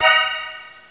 violine; Amiga 8-bit Sampled Voice

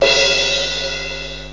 SCHLAGZ

